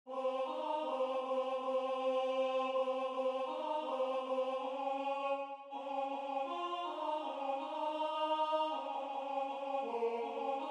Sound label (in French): alto; vive le vent; satb arr. james pierepont